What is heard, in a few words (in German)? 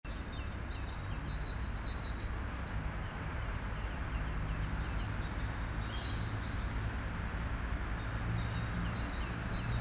Spielplatz normal